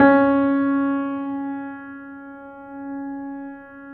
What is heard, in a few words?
PED MF, GRAND PED; Best Service Advanced Media Trax Modern Composer